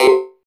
PERC 160 BPM; Ueberschall; Drum & Bass Flash Trackz

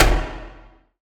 Low Stomp, Industrial Hits; Antidote Advent

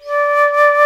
flute D3, ebony flute; InVision Interactive; Lightware VOL-1; Instruments & Percussions

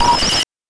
BANK2 SFX, NES DPCM; MIDINES

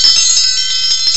BELTREE, BELLS; M8; CMI Factory

